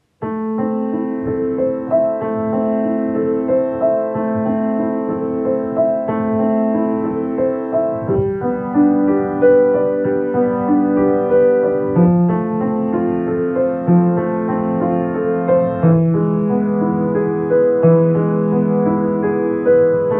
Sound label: Greensleeves chords